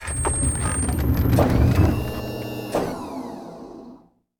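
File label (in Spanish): ESCALERAS PLEGANDOSE Y PLATAFORMA, stairs folding and platform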